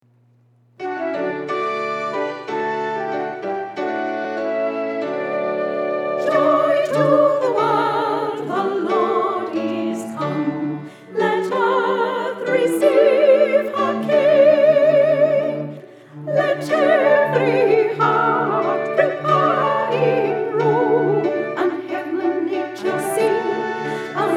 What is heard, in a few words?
joy to the world soprano